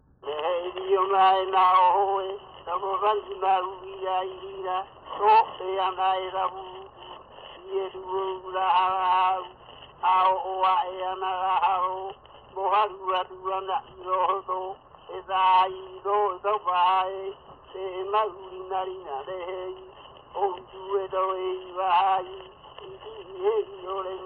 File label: Pig dance chant, Hawaiian; World Music